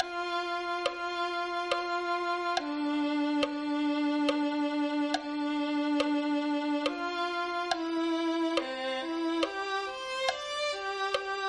violin

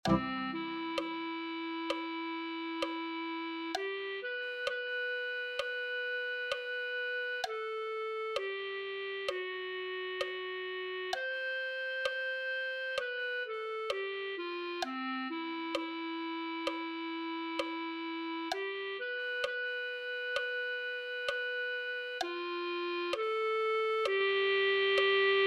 santo porque es glorioso clarinete

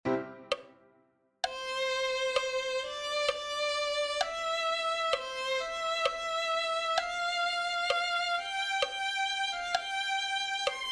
violin

